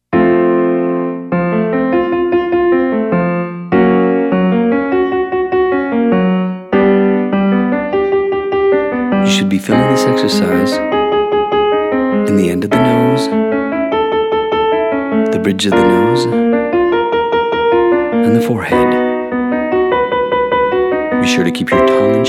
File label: Your Turn female; Exercise 6